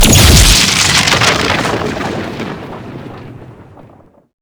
zombie spawn lightning; rave in the redwoods

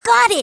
hit; tails

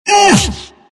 pain; tf; skeleton king

